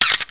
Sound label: Shutter; Let's Discover Michigan!